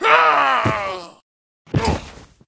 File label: night fighter dead, monster